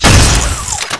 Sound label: flak cannon alt fire